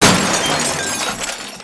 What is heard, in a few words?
tengu die